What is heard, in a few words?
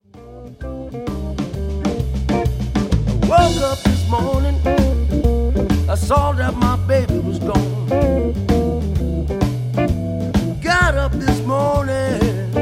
Blues